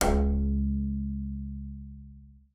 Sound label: BLEG C#2, BASS; BASS COL LEG; Xsample VOL-5